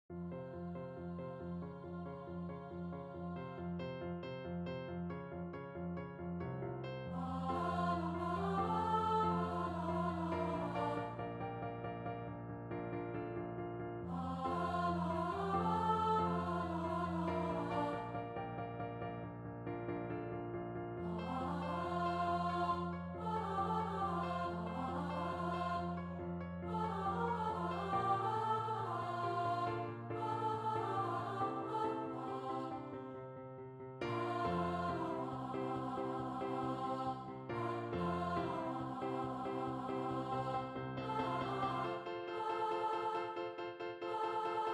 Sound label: altos